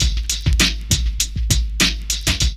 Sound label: LOOP; E-Lab; X Static Goldmine 2; akai